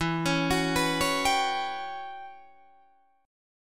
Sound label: E Minor 6th Add 9th, Guitar, DADGAD; chord shape